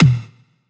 tom, Drum One Shots; red; Slate; SD MIDNIGHT Sample Pack

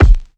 kick clack; Hip-Hop; Drum One Shots; SD CAICOS Hip-Hop Sample Pack; Slate

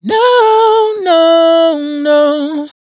riff G, Vocal, Dry Vocals; Raw Vocals; Slate Digital Omnivox Vocal Sample Pack

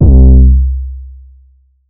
808 one shot C; Slate Digital BASSHOLE Sample Pack

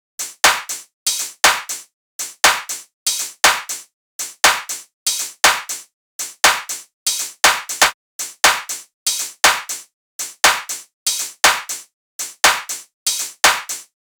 tops 120, Drum Sample Pack Loops Top Loops; SD SEISMIC; Slate

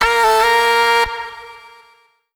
phrase Bb, Vocal; Slate Digital Omnivox Vocal Sample Pack

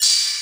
perc clack, Hip-Hop Drum One Shots; SD CAICOS Hip-Hop Sample Pack; Slate

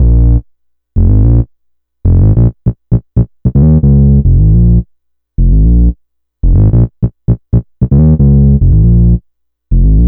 Synth loop 110 Bb, Synth Bass Loops; Slate Digital BASSHOLE Sample Pack

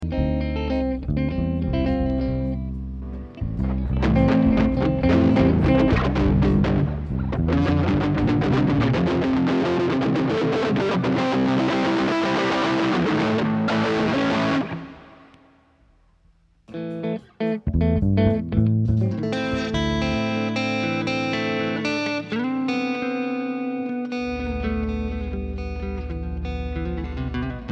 Strings

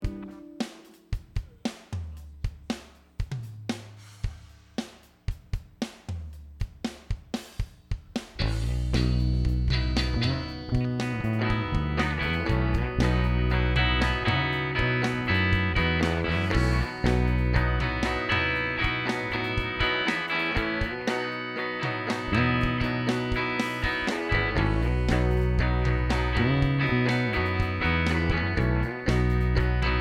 Strings Mix

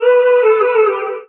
vocal yesterday G; SD AURORA Sample Pack; Slate

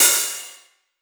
Cymbal Crispy, 909; 909 Cymbals; Slate; Vintage Drum Machines Volume 3 909 Edition

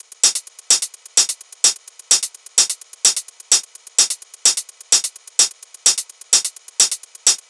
hats 128, Hat Loops; drum sample pack; Nantai; SEISMIC; Slate

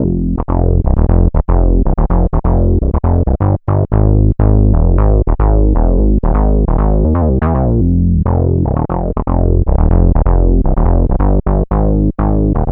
Synth loop 123 F, Synth Bass Loops; Slate Digital BASSHOLE Sample Pack

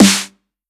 909 Snare

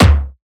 tom broken, Drum One Shots; Slate; SD MIDNIGHT Sample Pack

